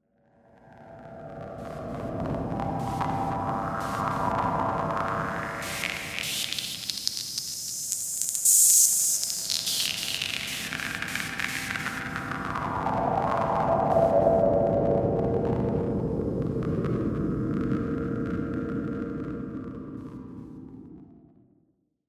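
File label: filtered noises and glitches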